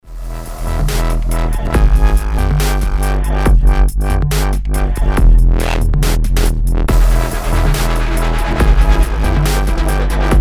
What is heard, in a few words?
dubstep; Akai